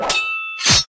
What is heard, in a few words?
Soldiers Fighting